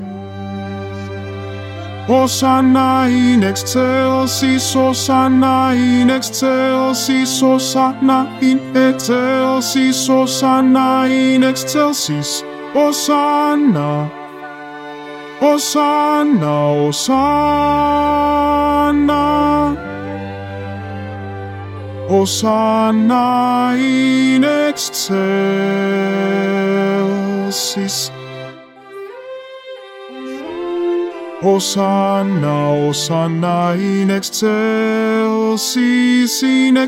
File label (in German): osanna bass